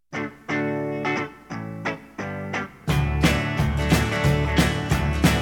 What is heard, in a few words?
music